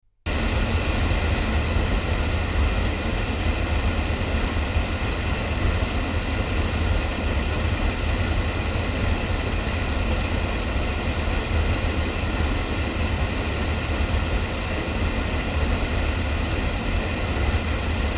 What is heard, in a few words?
ADK A48 omni loudspeakers, microphones recordings